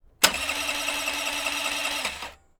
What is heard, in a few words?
honda elite scooter failed starts whining whir